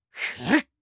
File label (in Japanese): 攻撃